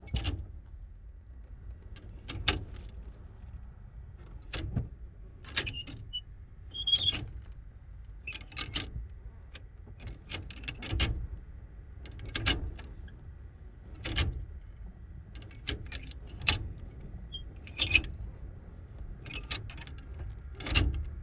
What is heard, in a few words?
1954 Big Rig Int Dry Shifts, Period Vehicles; Sony Pictures Sound Effects Series Volumes 1-10; Sony Creative Software